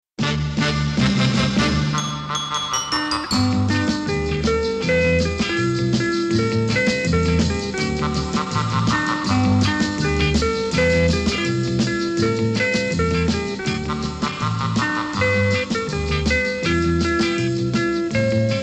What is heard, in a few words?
Instrumental; Fireball XL5; cartoons